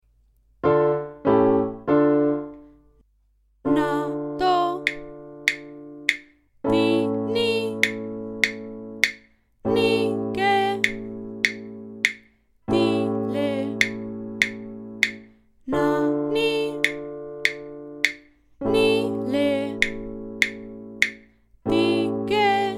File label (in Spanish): i V menor sílabas tonales con acordes re menor